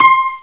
piano ac mod; orchestra, keystring

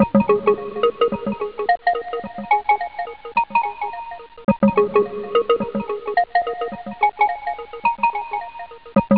pong; OctaMED SoundStudio V1

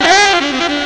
jazzy music, horn instruments; Sound Effects for Multimedia - Volume 1

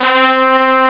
brass C3; Flashback Demo

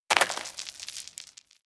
dance; Immortal

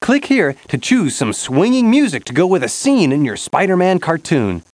music; Spider-man Cartoon Maker

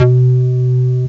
Hollow 303 C2; BassHits

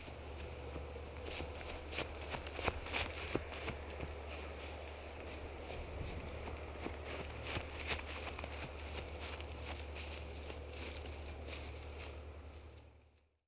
run grass; Media-Pedia; on foot

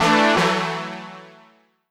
SYNTH PAD DISCO 125 A; eJay; Sphere

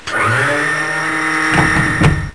GEAR; WarBirds v1.10r0

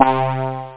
Banjo Syn; AMOSPRO2; Instruments

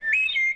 thrush, ambients

